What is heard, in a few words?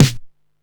snare, techno 138 BPM; Best Service ProSamples vol.54; AKAI